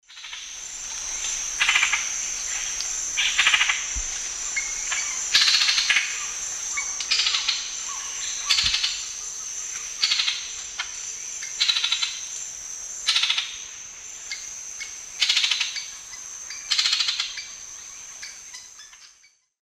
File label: Lampropsar tanagrinus, alarm calls; S. America; Eastern Lowlands